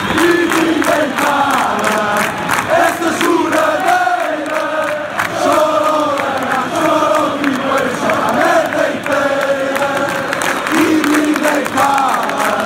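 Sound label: fanchants, football chants; e ninguem para esta choradeira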